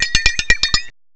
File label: STIR; M8; CMI Factory